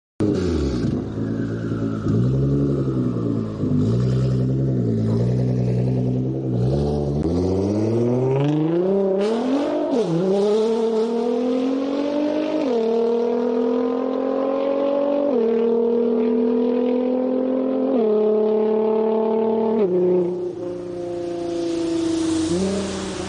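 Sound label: engine sound Nissan GTR R35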